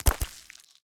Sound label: step, spider; Minecraft; Votive World